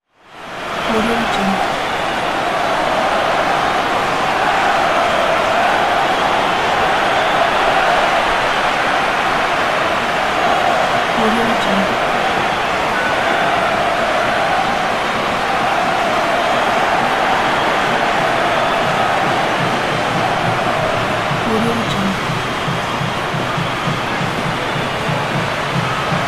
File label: noise of crowd stadium